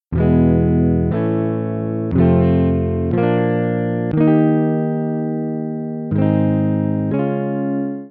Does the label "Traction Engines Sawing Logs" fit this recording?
No